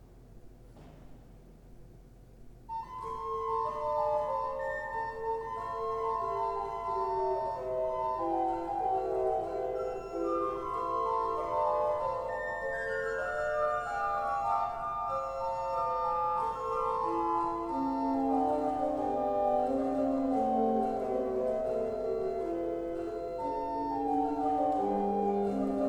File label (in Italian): Toccata sul Flauto; Costa Serina agosto 2010